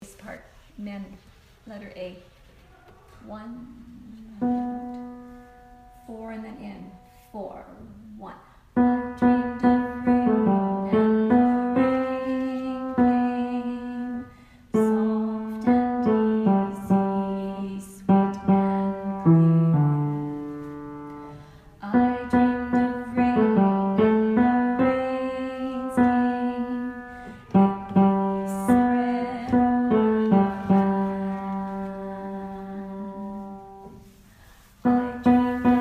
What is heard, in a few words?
Basses